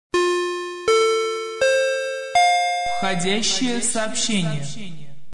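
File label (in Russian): railway station SMS, Сигналы SMS; Vokzal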